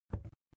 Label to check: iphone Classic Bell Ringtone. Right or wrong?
Wrong